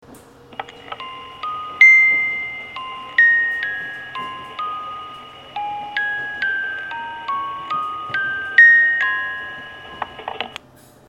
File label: chime; alps